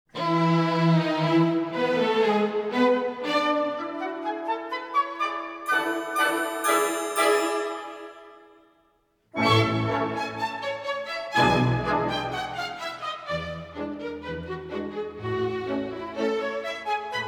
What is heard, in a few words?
Diana and Acteon Girls Soloist Variation; Filles; variations classiques du concours pre pro